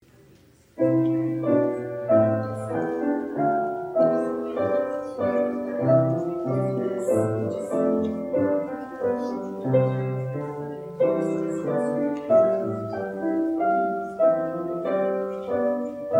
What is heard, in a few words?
preservice, instrumentalists